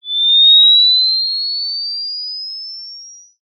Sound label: cartoon ascend rise whistle